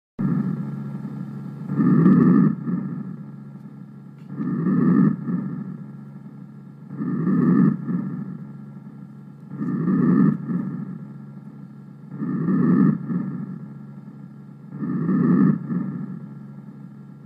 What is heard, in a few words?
Bronchial breathing; simulatorvzorky